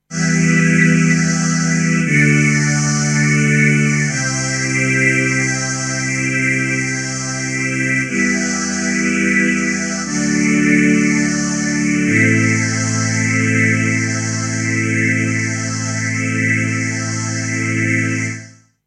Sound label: Vintage String Ensemble Moogerfooger 12 Stage Phaser